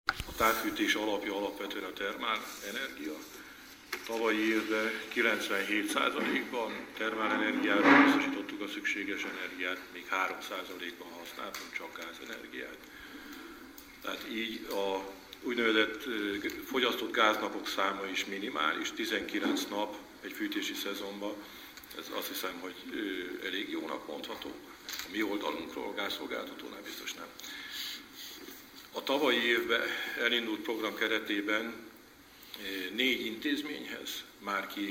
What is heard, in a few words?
digitar